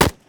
ak dist; Weapons

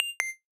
reset, radio

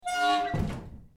electric door

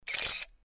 traffi, traffipax

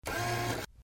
plug, Supercharger

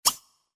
scroll menu

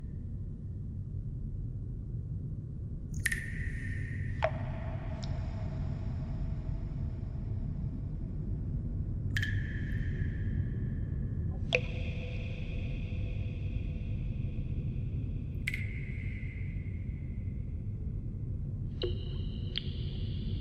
lobby loop

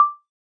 tempo set

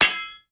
hit

